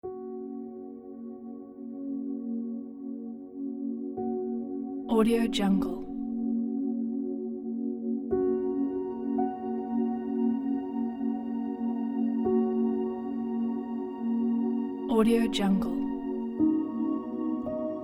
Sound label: inspiring soulful meditation ambience; interstellar-studios